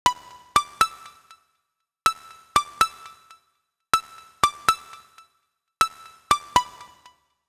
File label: Loop Melodics 120 E, Deep House; Loonge; Ghosthack; Advent Calendar 2019